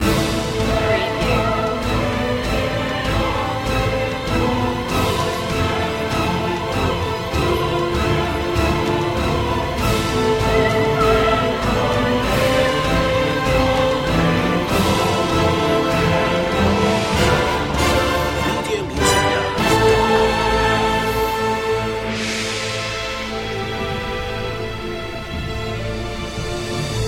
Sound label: full mix